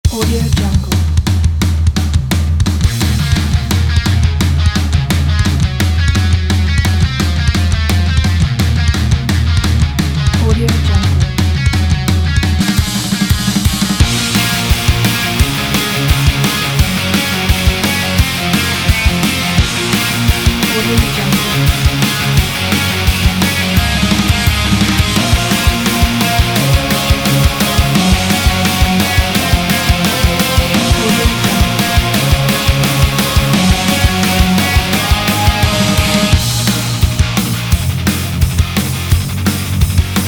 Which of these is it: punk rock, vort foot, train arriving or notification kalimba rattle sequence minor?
punk rock